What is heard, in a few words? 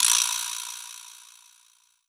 Vibraslap; Korg N1R